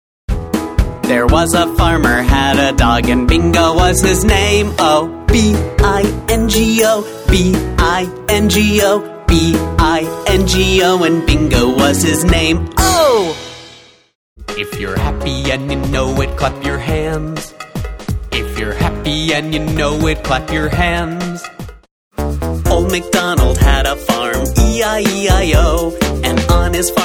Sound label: US Songs